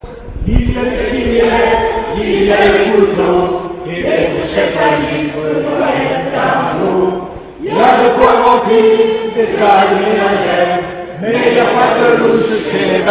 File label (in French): chorales